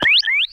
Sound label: Flying Manta Ray